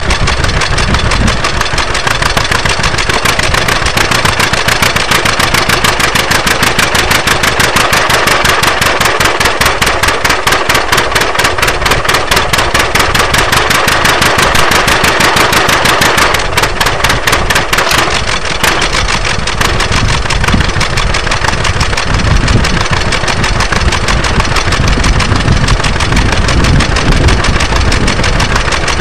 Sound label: tractor john deere